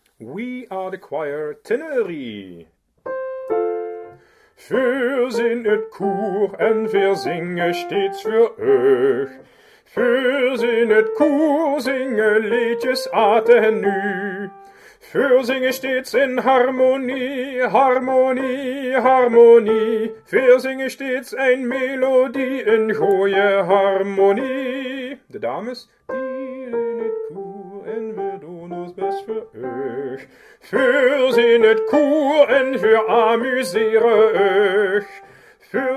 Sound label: Tenor